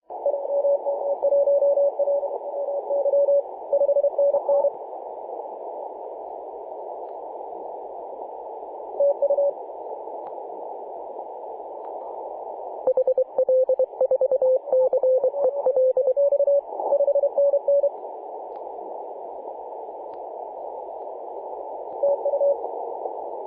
10 113mhz cw; ok1ng

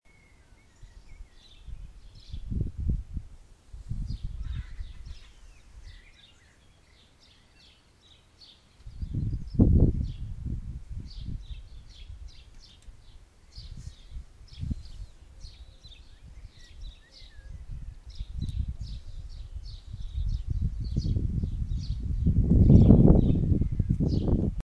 birds and chickens; sutton gault

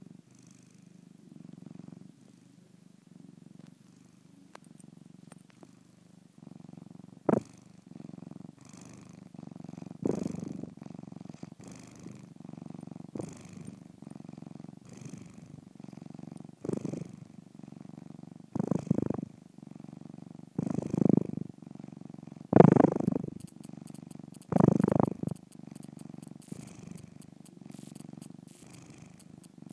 a very purrrrrry

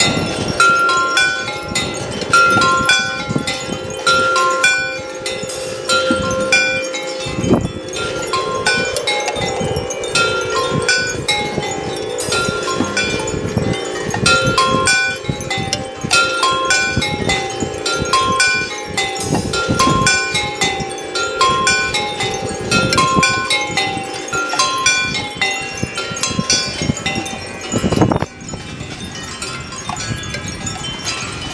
drums and base

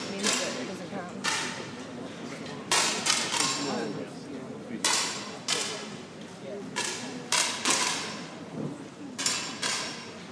air rifles volleys pinging; olympics2012

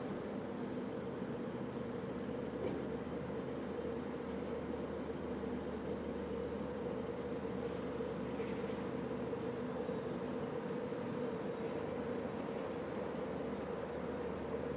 outside peterborough train station ambience

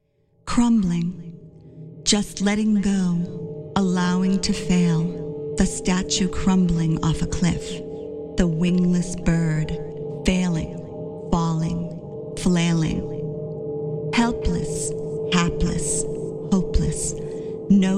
crumbling

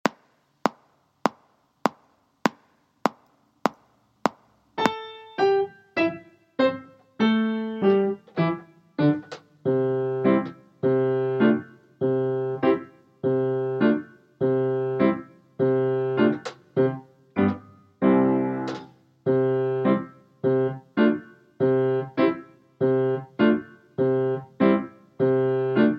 shepherds hay c clarinet piano only

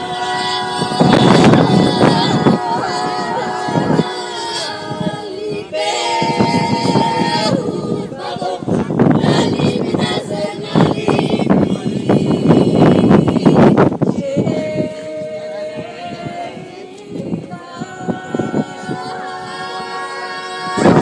choir on a boat

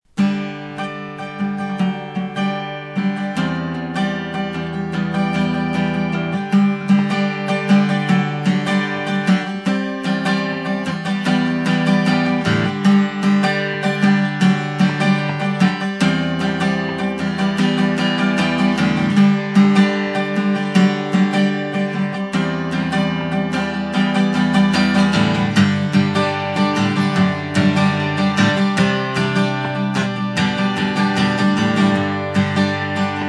guitar

